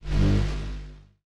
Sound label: factoryboss force Field Up